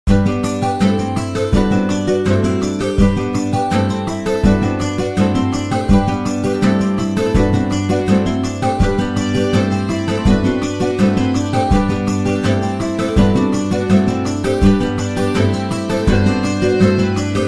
Snow; SMM2; SMB

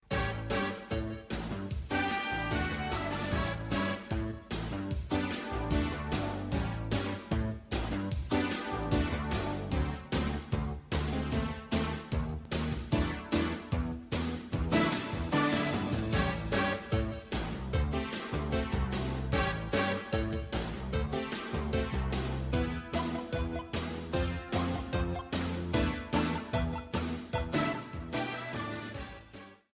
In My Frog Suit Instr; Speedy Gondola

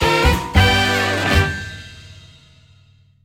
Quiz Fanfare

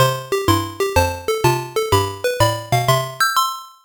Victory Jingle; Donkey Kong 3: Dai Gyakushū

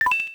Coin Appear; NSMB

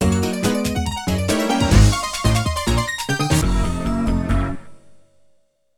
Stage Beaten; Hotel 6; List of Hotel Mario media

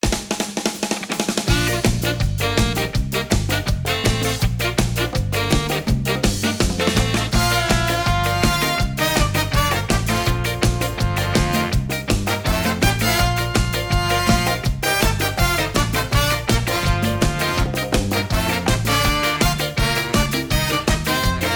Flower Field BGM Ska Arrangement

